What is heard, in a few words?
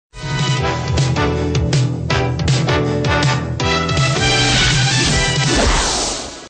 Breaking News Alert